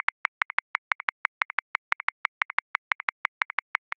Kuduro Displaced Triplet Accent 8T; Grooves; Ableton Live 12 Lite; Core Library

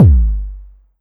Tom Low DMX Tonic, Drums

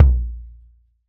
Kick Marching Drum

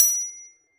Triangle Charred; One Shots; Drums; Ableton Live 12 Lite; Core Library

